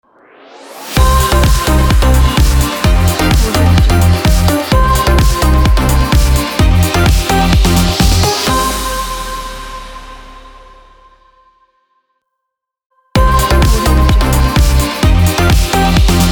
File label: dance opener